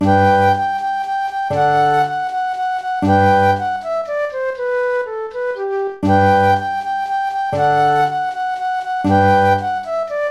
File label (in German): alter ungarischer tanz